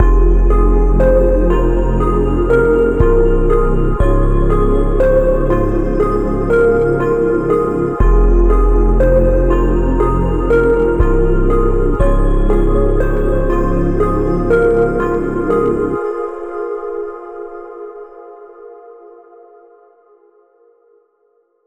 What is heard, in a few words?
Melody Future